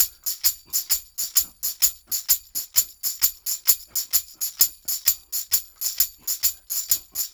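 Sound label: TAMB, tambourine; Drumdrops In Dub VOL-1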